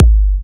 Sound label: Progressive Kit Bassdrum; Mutekki Media; Vengeance Electroshock Vol.2